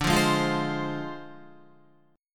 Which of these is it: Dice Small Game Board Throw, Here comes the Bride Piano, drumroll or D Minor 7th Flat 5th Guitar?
D Minor 7th Flat 5th Guitar